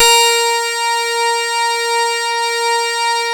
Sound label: A#, PIANO; Big Fish Audio; Synclav FM